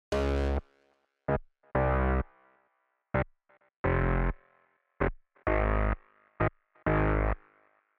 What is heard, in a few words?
Synth